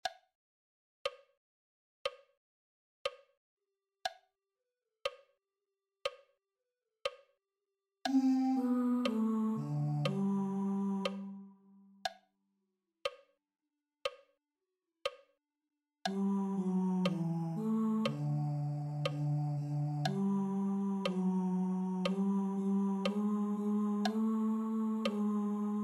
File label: basses; telemann; laudate jehovam